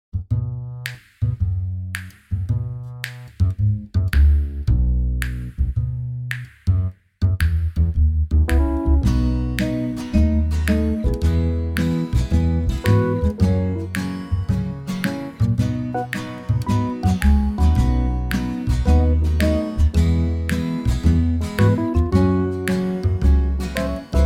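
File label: B to C, Backing Tracks for Male Vocals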